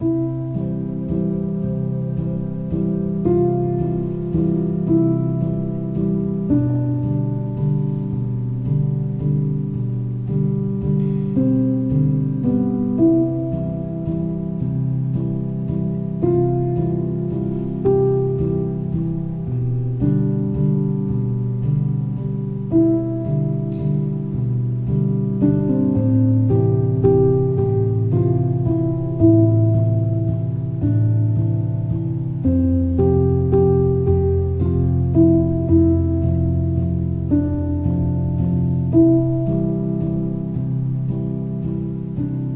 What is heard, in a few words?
Instrumental